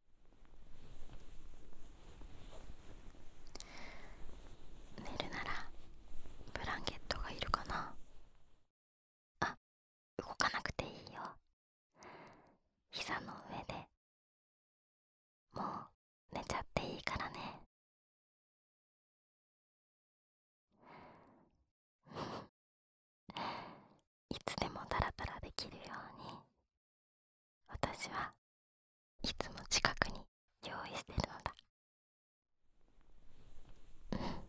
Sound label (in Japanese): 膝枕でお昼寝